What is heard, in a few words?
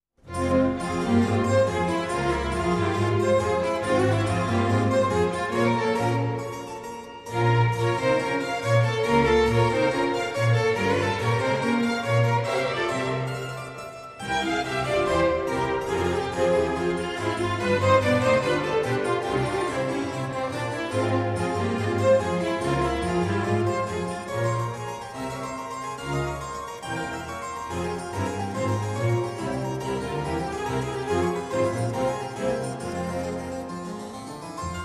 koncert dlja klavesina fa minor allegro; BWV 1056